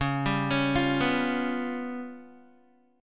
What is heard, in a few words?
Db 7th Flat 5th, Guitar; Db7b5 arpeggiated; JGuitar